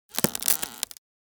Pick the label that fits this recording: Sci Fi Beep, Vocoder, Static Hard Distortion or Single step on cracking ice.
Single step on cracking ice